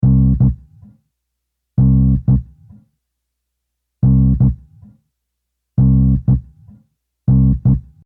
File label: Bass